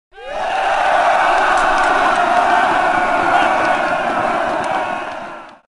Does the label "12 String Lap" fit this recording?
No